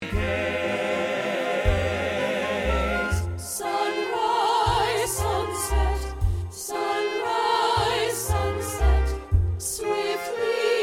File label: auditon track guide vocals; Fiddler Auditions; SopAlto